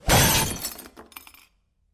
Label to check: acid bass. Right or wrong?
Wrong